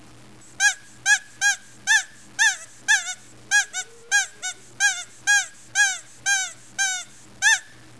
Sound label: Hand Squeak